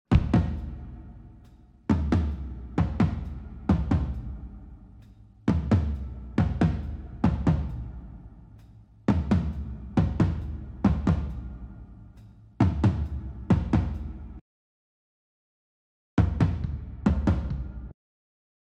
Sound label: drums